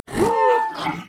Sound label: wolf angry; LoL; monsters